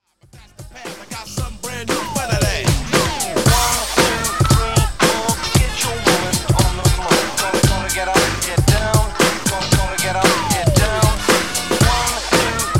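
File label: Old School HipHop; Tribute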